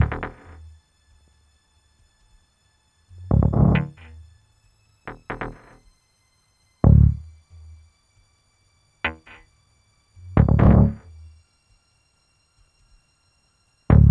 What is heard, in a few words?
Bass Effect; 77.42